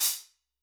Sparkle Steam Engine A, Hats; Airwindows

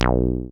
SYN 303 C2; Best Service Gigapack 1; SYNTB303

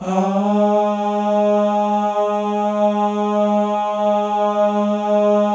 MALE AHS, Symphony of Voices; Spectrasonics

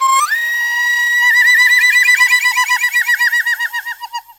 CHINA, Heart of Asia; Spectrasonics; CHINAFLT

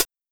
Linn, LinnLinn Drums

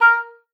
A#4, OBOE; Xsample VOL-6

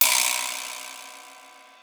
TEKNO; Rubber Chicken Software Sample Bank

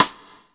SIDESTICK; Instruments & Percussions; InVision Interactive; Lightware VOL-1

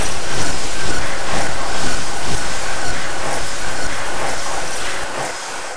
loop, techno atmos

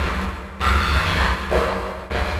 Metal Maqsuum; Sonic Foundry (Sony Creative Software); Loop Noir

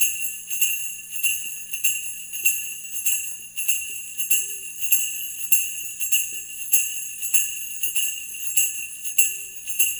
BELLS, Funky Ass Loops; East West

